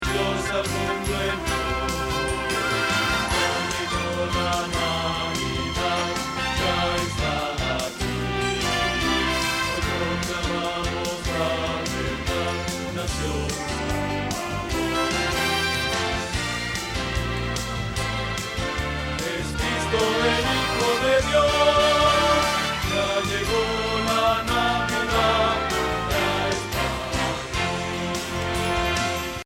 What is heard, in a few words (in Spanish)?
Bajo